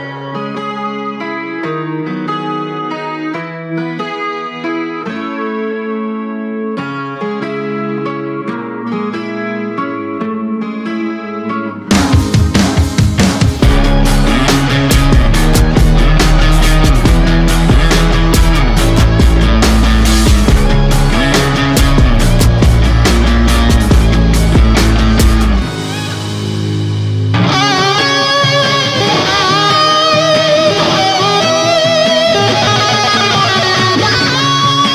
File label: solo guitar